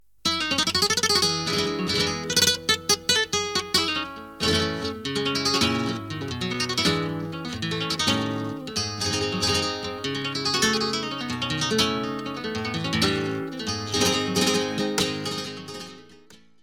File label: fandango abandolao